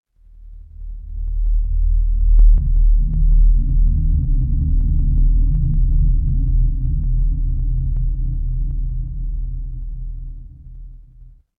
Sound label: horror drone